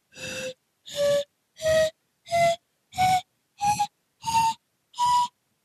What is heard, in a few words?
pan onkai